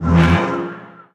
Synth Shots; Vengeance Samples

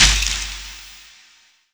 Impacts

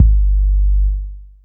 Bass Drop, 808; Street Anthems Sound Kit

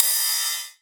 Ride, Cymbals

